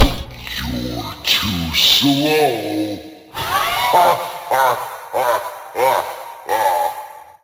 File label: Failed; Friday Night Funkin' Playable Final Escape OST mod Windows gamerip 2022